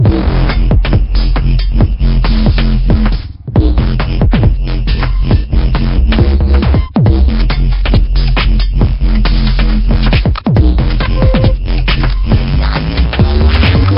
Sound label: Hill Background; Pixel Gun 3D